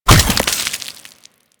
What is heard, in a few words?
Cluster Explosion; Uzi; Warframe; Infested